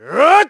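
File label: Vox Attack, Korean; KaselB; kingsraid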